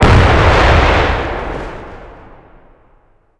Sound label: Amb Destruction; Red Orchestra Ostfront 41-45